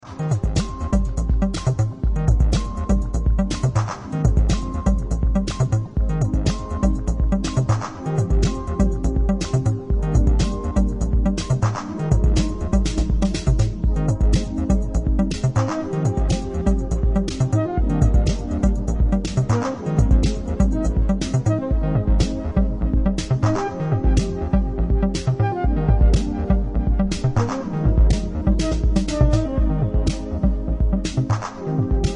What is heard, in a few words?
flute